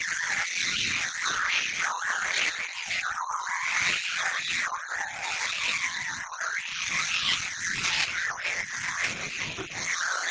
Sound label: coral conch shooter heavy sing loop; Hollow Knight: Silksong